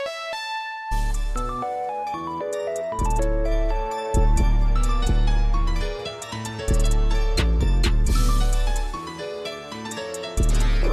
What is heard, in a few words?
nokia 640 ringtone